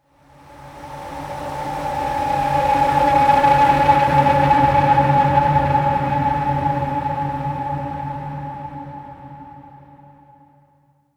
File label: Air FX Am 65Bpm; STEMS; Ghosthack; SkyRealm; Kit 27 - The Crossing Of Jade Sea